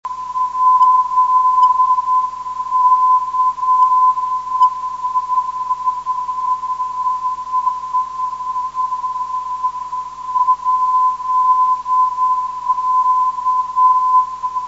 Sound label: 733khz